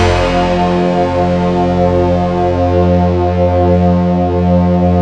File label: STACK E, YAMAHA FM SYNTHS; Rubber Chicken Software Sample Bank